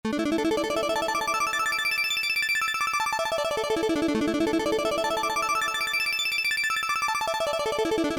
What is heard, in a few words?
FX; PatchFactory